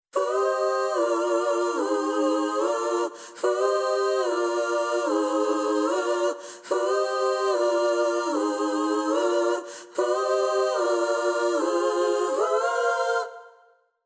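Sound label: Jazz Waltz Chorus Uh Ah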